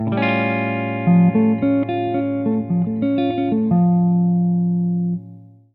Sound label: Arp; theorie; scales